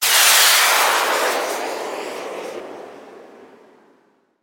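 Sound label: sound of a rocket launch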